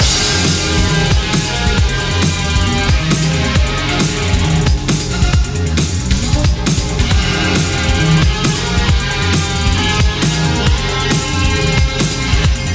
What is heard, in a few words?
loop; macross